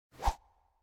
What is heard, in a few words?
move; slope-ball